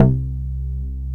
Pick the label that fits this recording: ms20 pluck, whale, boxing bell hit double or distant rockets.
ms20 pluck